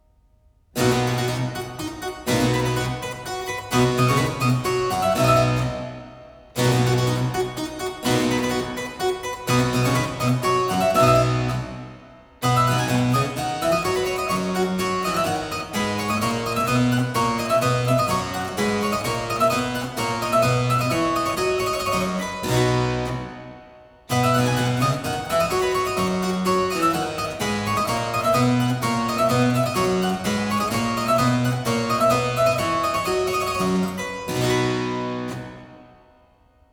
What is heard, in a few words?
Trumpet tune, harpsichord; Complete Chamber Music; Brilliant Classics; Miscellaneous pieces for the harpsichord